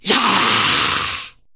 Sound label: Attack; Ionar